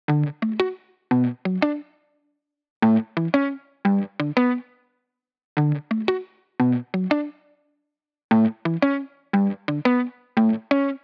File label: 175 BPM D Maj Guitar Arps; Cymatics; Miami Guitar Pack - Pt 1